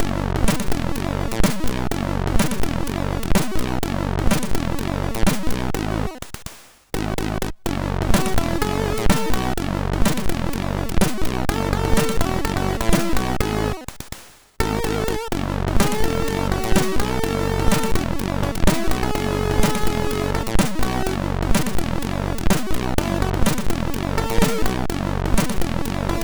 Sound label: Weird Tune; MOS6581R3